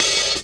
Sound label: raw open hi hat c sharp key